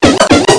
Alert; Racing Days R DEMO

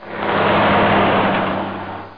wheeled; lgeneral-1.1

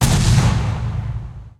explode

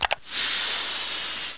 wire; Dino Crisis; door

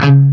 chug C#2

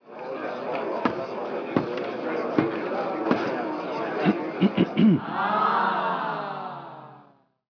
podium, Ambient; ZEUS

